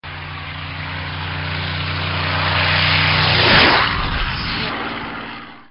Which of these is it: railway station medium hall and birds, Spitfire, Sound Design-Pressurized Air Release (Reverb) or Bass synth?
Spitfire